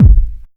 reverb kick one shot g sharp key

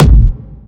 mid heavy bass drum one shot a key; kick drum samples

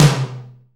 short reverb tom drum single hit b key

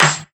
Dry Snare Drum D Key; snare drum samples

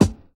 00s hip hop steel kick drum sound a sharp key

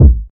round kick drum single hit b key

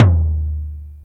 large room tom drum one shot d sharp key

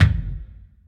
long kickdrum sound c sharp key

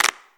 old school hip hop clap one shot b key

